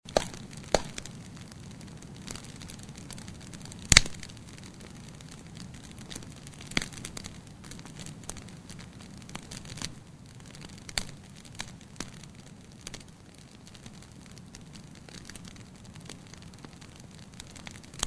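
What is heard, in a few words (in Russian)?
звуки костра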